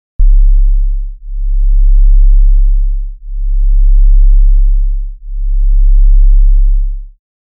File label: 34hz test tone